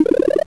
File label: Item Sprout; SNES; SMALLSTARS